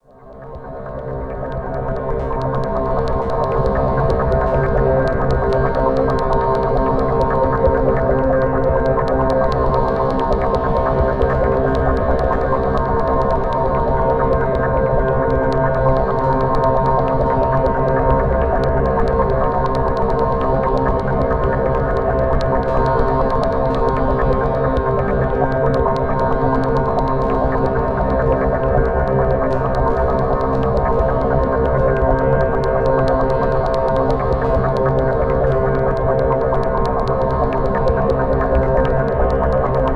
Ear Fuzz; Harmonic Atmoshperic

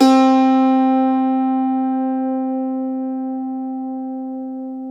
IRISH HARP

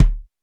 sfx perc

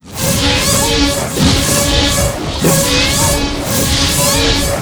SCREAM; Disjointed Textures; USB Soundscan vol.33; AKAI; CHAOS

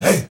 HEY HEY

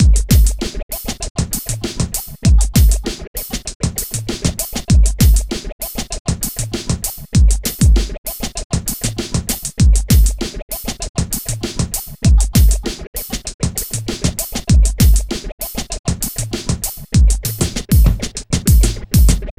Beat, Drums & Percussion; Electrocution; Beats

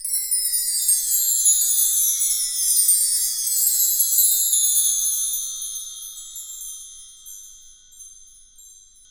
PRC Wind Chimes; Roland LCDP03 Orchestral Perc

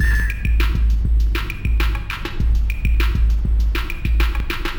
Ghost Loop 100BPM, drum loop

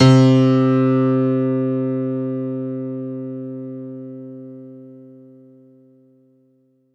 C2 DANCE, ANALOG SEQ, Roland JV 2080

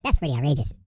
E TALK, Vocal Planet R&B & Dance

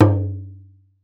Talking Drums, f1; Retro Sampler; EMU E-III

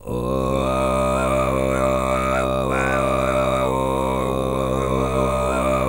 FREE, TUVAN LOW; Spectrasonics Vocal Planet CD5; World Voices

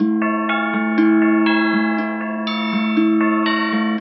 Ambient Bells, Percussion Loops

